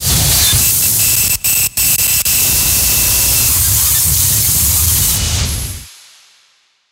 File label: sonar Distortion; Barotrauma; Real Sonar